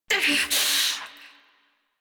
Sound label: Pain Long; New War